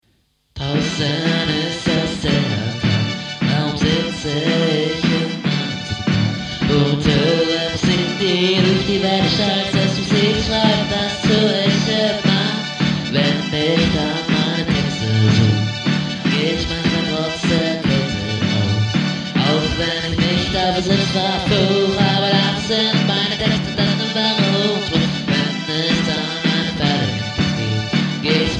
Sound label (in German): es ist kalt orchester; Medienzentrums Regensburger Land